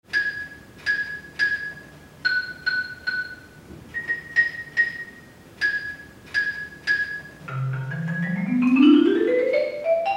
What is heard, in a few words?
Marimba, orgel